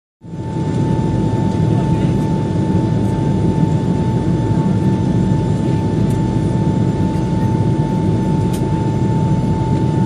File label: Jet; Interior; Interior Boeing 727 Constant In Flight.